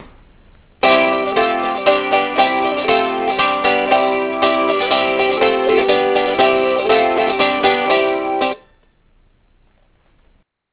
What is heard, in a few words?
cittern; baltimore